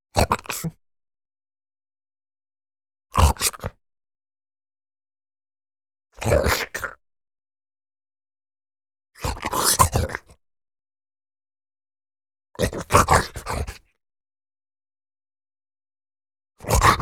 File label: ZOMBIE MALE CHEW EATING FAST VARIOUS LENGTHS